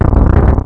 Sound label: dungeon block; zelda